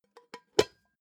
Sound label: pot lid open plop; objekte; topf